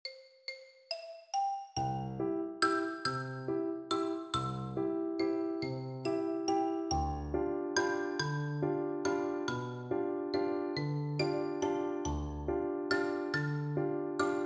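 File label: xylophone, percussion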